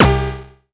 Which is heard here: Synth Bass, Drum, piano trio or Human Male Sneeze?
Synth Bass